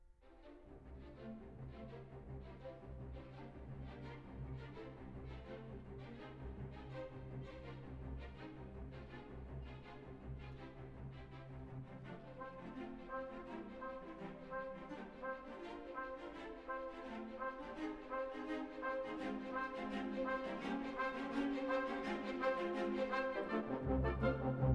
old Norwegian melody with variations allegro marcato